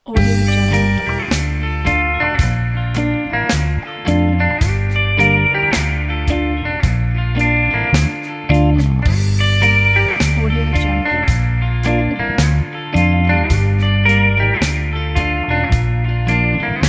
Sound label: spooky blues